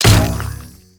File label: Mushroom Bounce Impact; Warframe; Nokko